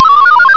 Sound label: cmptr; TinyTown